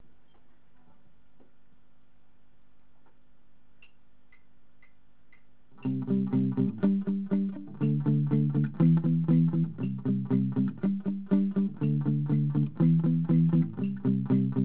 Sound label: palm muting